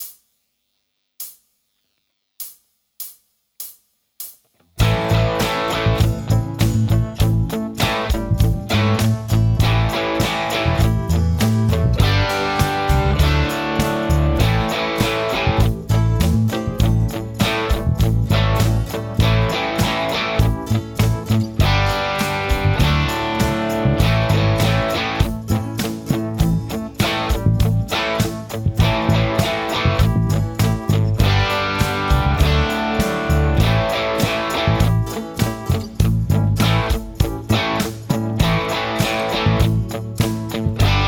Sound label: Backing